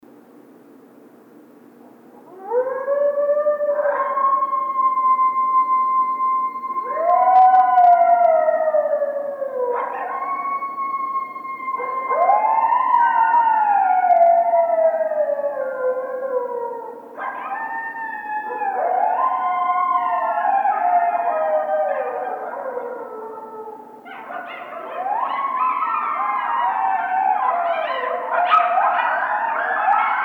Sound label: howl, Coyote